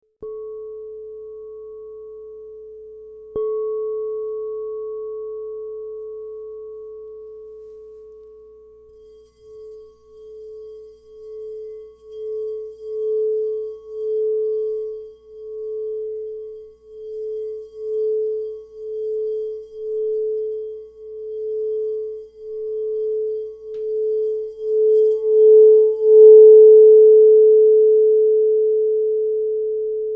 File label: Crystal Tones Singing Bowls